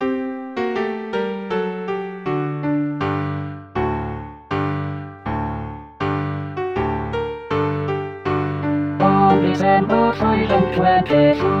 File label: SATB; FUM FUM FUM